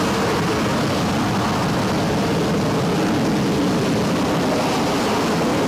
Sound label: rocket; mcheli